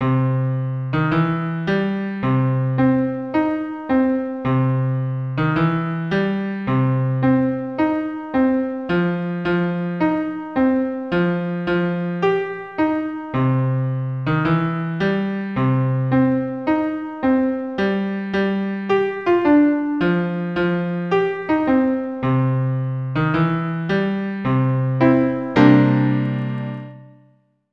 Blues - 108 bpm

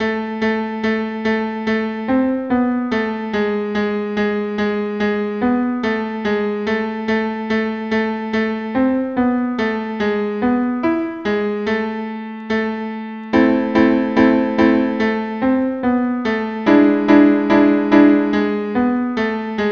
144 bpm; Russian Sailor Dance

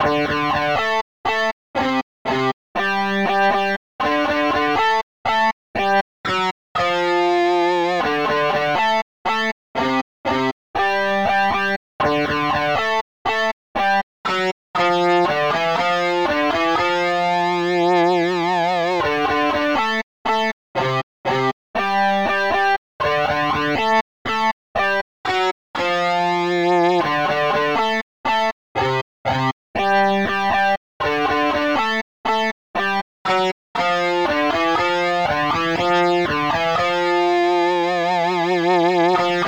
Four To The Floor single notes 120 bpm

